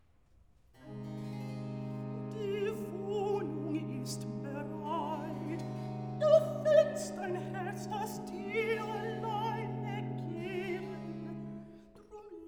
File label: Recitativo (Alt), BACH for ALTO; Wer mich liebet der wird mein Wort halten II; Die Wohnung ist bereit